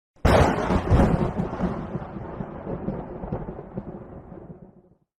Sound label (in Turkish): simsek cakmas ses efekti